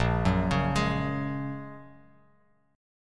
A sharp Minor 7th Sharp 5th A sharp, Bass Standard; arpeggiated; 6,9,11,13